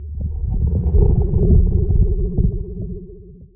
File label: Egg; Seer; GamigoNA